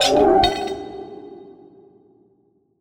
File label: Ui Chest Item; Android; Portal Quest